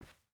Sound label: footstep boots cloth walk; Rust